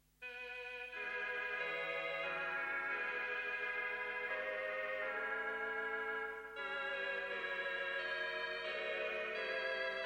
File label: Vox Choral; Franck